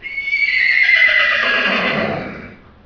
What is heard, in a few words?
Horse Neigh